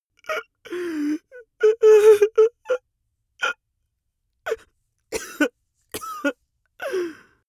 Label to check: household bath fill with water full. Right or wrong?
Wrong